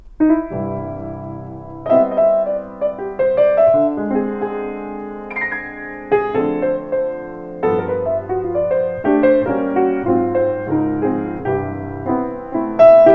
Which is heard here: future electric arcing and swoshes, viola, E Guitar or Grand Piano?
Grand Piano